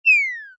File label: Lose head down; Toontown Online